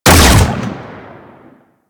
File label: Fire; Seer